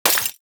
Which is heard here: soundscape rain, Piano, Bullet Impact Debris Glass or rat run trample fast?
Bullet Impact Debris Glass